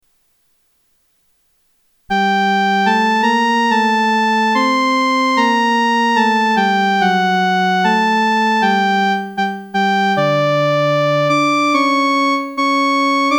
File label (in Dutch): frans lied opname feuilles volages instrument eenstemmig